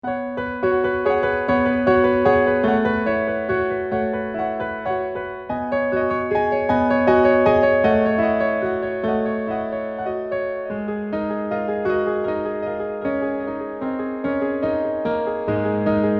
Piano; music samples for mary katzke lymphodema film

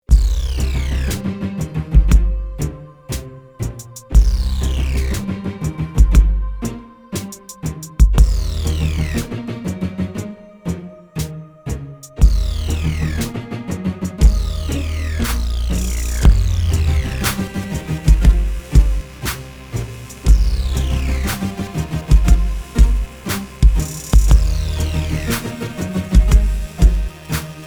Trailer Music

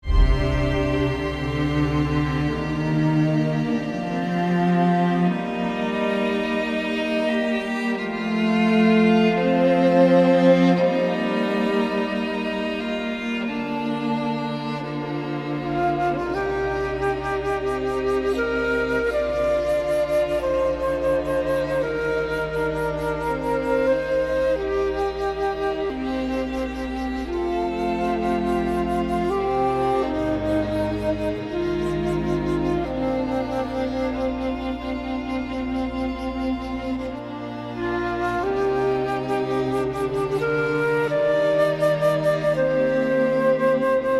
String Trio; Remembering

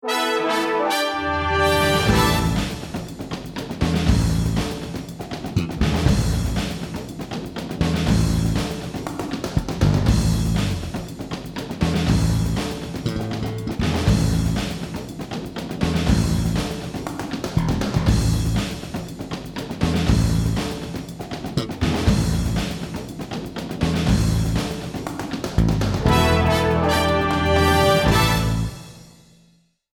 no brass

